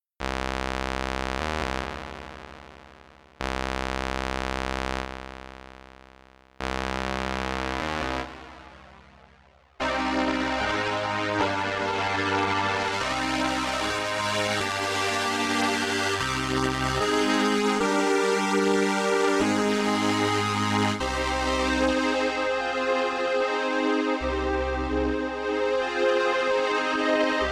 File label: strings tone filter ensemble